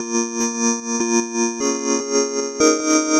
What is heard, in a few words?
150 Krisp N Dry Pads, 150bpm; Combi Loops; Nu Dance Electro